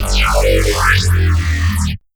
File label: Sound FX Bass Explosion Laser; Apache